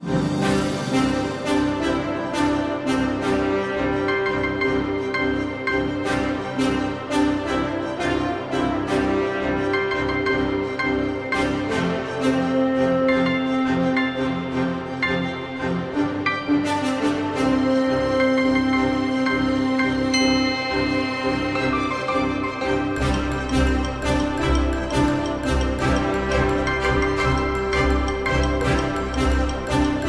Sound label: Epic Cinematic Music